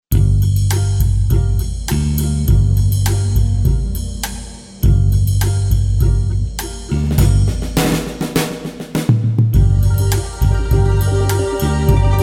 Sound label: balalaika